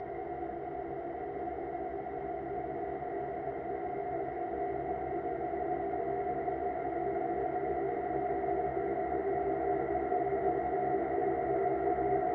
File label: Sample Tri Tones Eight; SoundChaser; CerebralAudio